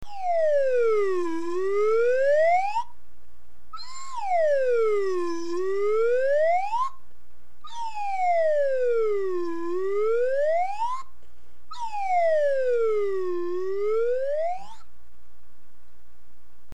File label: slide whistle